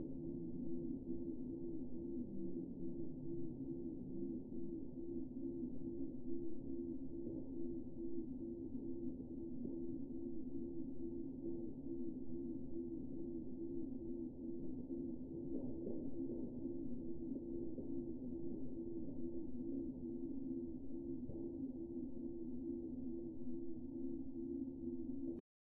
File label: woodpeckers